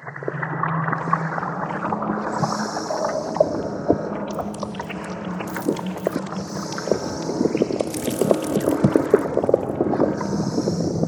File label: Cockleshell Idle; We Are the Dwarves